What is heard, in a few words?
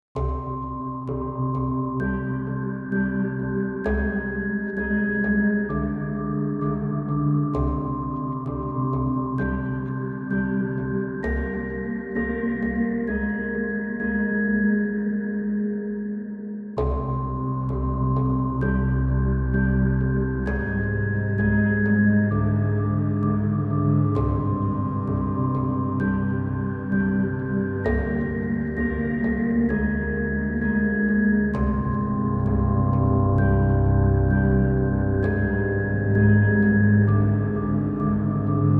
Bell Shoes, Tension 공포